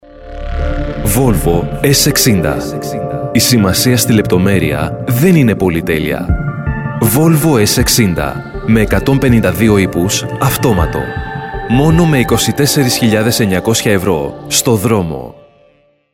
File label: Corporate